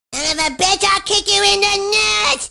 kick; Hacked Hot Wired and Channeling Cartman

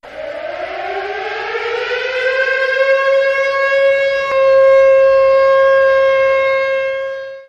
raid siren

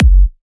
kick big; Sample Pad Controller Using Pure Data

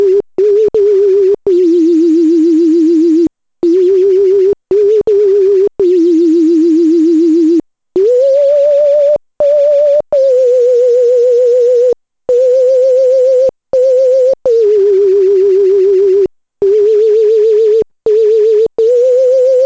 Silent, Arduino Tunes

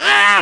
screaming; Hacked Hot Wired and Channeling Cartman

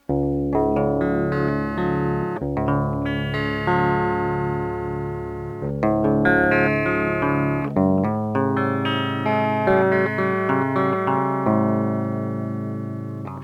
clean, JCM900 Guitar Amplifier; Marshland Pocket JCM900 Guitar Amplifier

